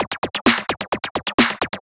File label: 130 BPM, Drum Loops; Zero-G; Club Techno; Drum Set